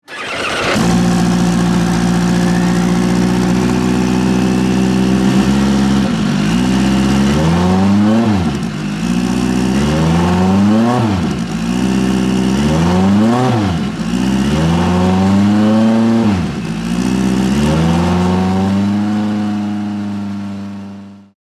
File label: Nissan R390 VRH35L